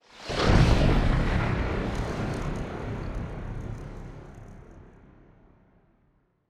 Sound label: ship corvette move; Stellaris; machineage; ships